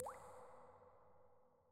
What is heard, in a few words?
Water Drops Single; Inquisitor 2